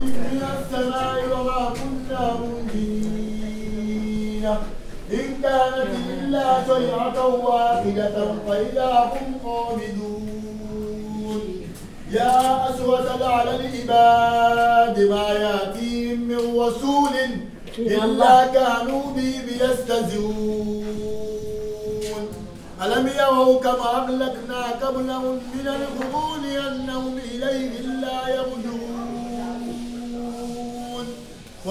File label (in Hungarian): utcan eneklo arab ferfi